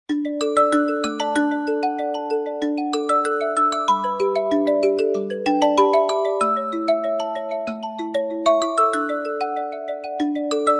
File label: light background music tone ringtone